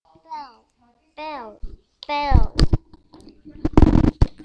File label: bell